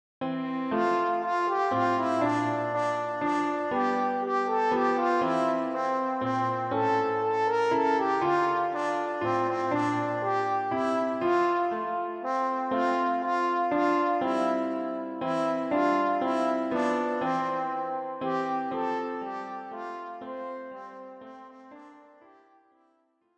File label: Horn